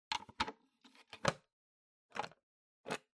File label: Time Recorder Key Cover Close